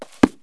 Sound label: close bk, open clos; KABOOM! - Wild and Wacky Sound Software; house